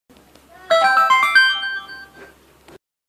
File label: 1up sound